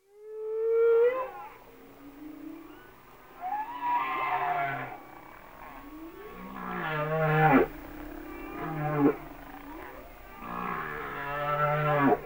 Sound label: Sounds of Nature - Humpback Whales